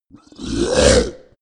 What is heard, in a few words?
monster attack gurgle low; koerpergeraeusche; comedy-cartoon; soundpool